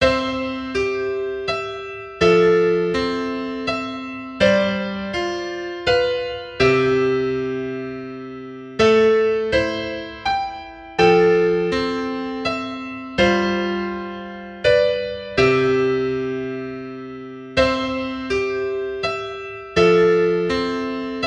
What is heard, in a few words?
piano version